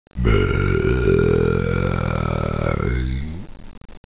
Soft, Rutti

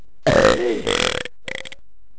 Modulato, Rutti

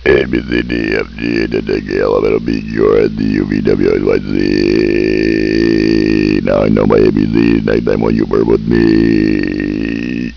Monologo, Rutti

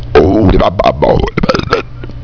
Dark Song, Rutti

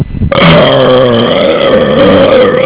Violento, Rutti

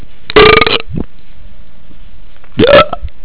Rigurgito

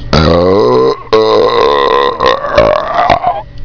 Orgasmo

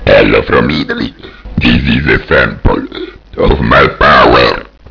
Italy, Rutti

